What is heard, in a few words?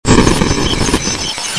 warcry; drwho; bullseye